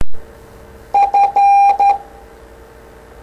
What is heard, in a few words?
Morse F